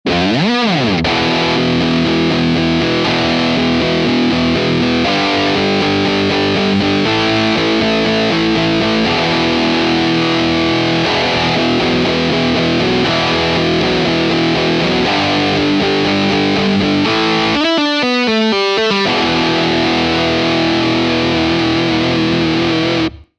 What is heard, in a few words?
lp crunch